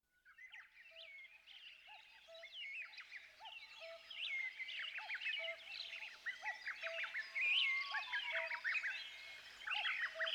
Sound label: Minuet; Nature Music; Birds